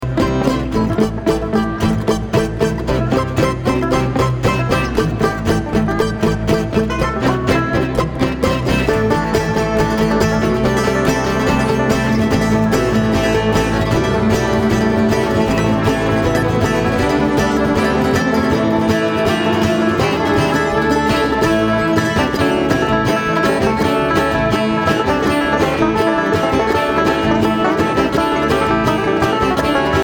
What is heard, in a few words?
Traffic Jam